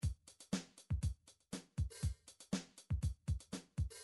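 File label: midi drum patterns drums pop ballad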